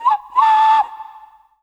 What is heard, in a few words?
WildFX, African; Quazibeat; African Secret; SFX